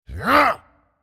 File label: effort; Ryze; Character Voices - English